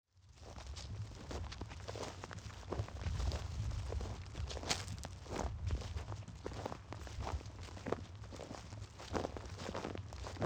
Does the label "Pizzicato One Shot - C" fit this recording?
No